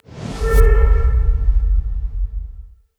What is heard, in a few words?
Simple Transition